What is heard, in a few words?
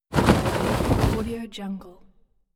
clothes rustle; alexsandal